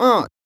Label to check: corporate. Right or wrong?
Wrong